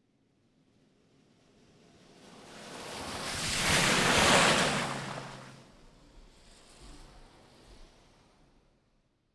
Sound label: Automobiles various